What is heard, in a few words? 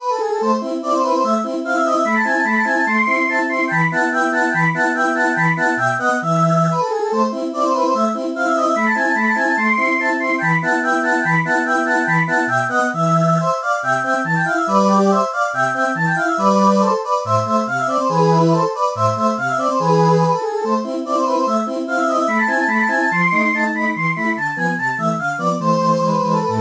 synth voice